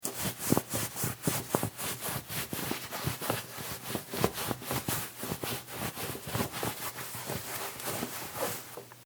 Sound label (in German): Handtuch Hose reiben